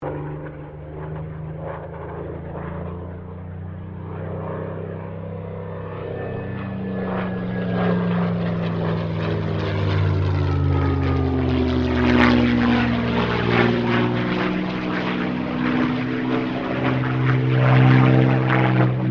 AIRCRAFT SUPERMARINE SPITFIRE MK-XVI PASS; Airplanes (Sound Effects:Vehicles)

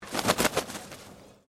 Bird Turkey Domestic Wing Flap; Birds; Animals

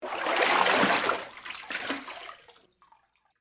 Water Movement Tub, Water, Movement, Large, Tub; Water Sound Effects; Water (Sound Effects:Nature); BlastWave

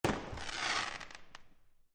Firework Explode: Exterior, Spark At End; Fourth Of July

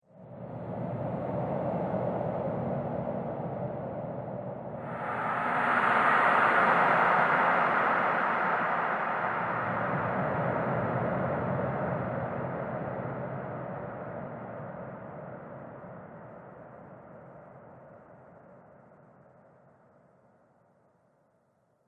Horror Scene Artic Evil; Scary Soundscape Sound Effects

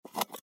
Camera Lens Cap Pick Up Wood Digital; Technology; Cameras; Blastwave

